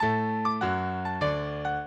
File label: minuet, mozart